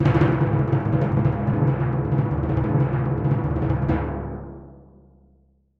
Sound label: tympani roll